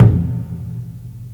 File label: normal hit whistle